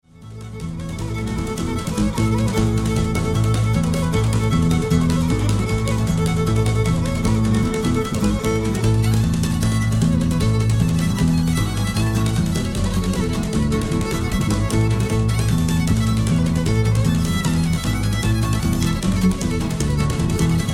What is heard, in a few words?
Irish Suite